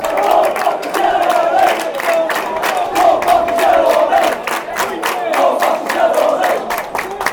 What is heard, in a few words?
yellow army, fan chants; paul buckles